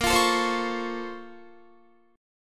Bb Minor Major 9th, Guitar; BbmM9 strummed

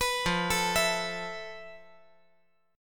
F Major Sharp 11th, chord; 16,17,17,19; down; 5G,4C,5E,5A; chord shape

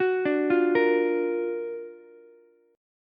F sharp Augmented Major 7th F sharp Ukulele; arpeggiated; 11,14,13,13; JGuitar

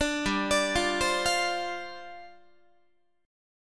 G 7th Suspended 4th G down Guitar

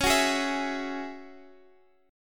Ab 7th Suspended 4th Sharp 5th Db, Guitar

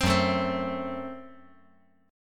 Gb Diminished

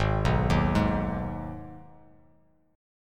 G sharp Minor G sharp down Bass Standard, chord; G#m arpeggiated; JGuitar

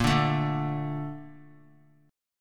Bb Major 7th, chord; BbM7 strummed; JGuitar

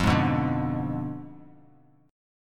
F Diminished 7th F down Bass Standard; Fdim7 strummed; JGuitar

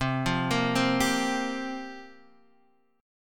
C 7th Suspended 4th, Guitar, Half Step Down; C7sus4

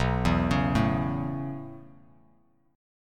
B 9th Suspended 4th B down Bass Standard; B9sus4; arpeggiated; JGuitar

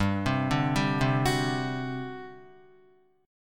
G Major 7th Suspended 4th, G, down, Guitar; GM7sus4 arpeggiated; JGuitar

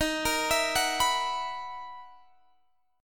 B Major 11th D sharp, guitar

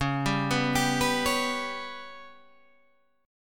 G Major Flat 5th chord

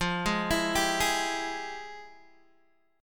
F Minor Major 9th, Guitar Open D, chord

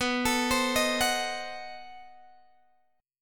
B 7th Flat 9th, down, Guitar, Open G

